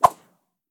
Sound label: Releasing Bow String; SNKRX